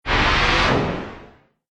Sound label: Laser Power Down Sound